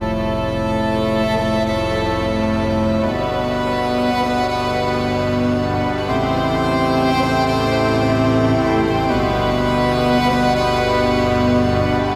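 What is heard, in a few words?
Mournful Strings, A minor 158 bpm; MIDI Clips; Tonal; Motifs; Ableton; Factory Packs; Mood Reel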